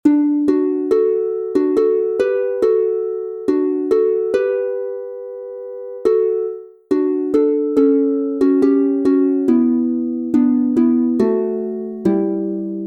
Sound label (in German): Michael row the Boat ashore D Dur instr, harfe; Gospel Spiritual Blues